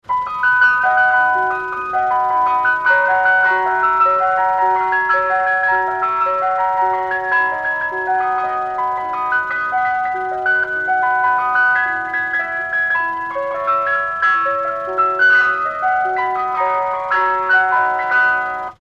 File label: Ice Cream Van Music, Blue Danube; Miscellaneous Musical; Tom Hutchings